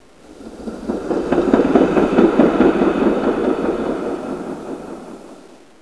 tramvaj; pavouk

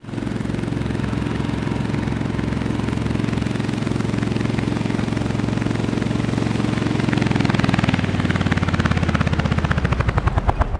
auto; Krtek

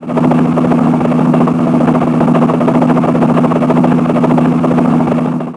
vrtulník; Krtek

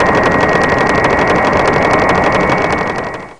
továrna; pavouk

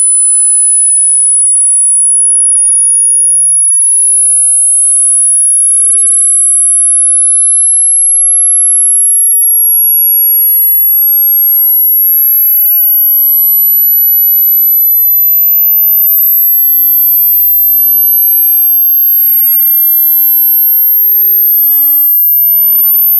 10 az 18 kHz

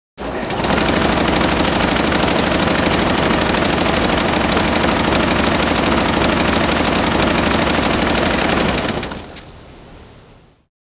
SKUTR; Krtek

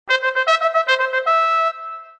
menu nastaveni; BecheruvSen

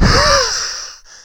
vykrik

